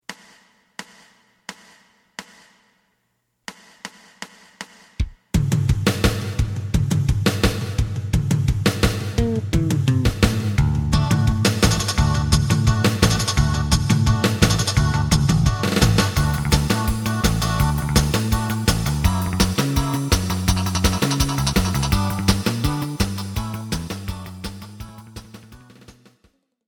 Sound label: lead